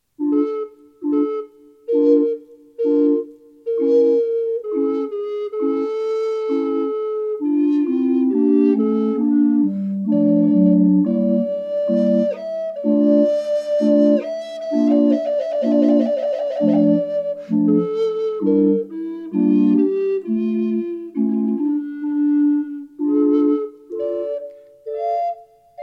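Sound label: organ; Aus der Tiefe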